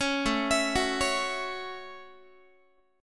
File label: A 6th A Guitar, chord; A6 arpeggiated